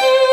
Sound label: violin; osu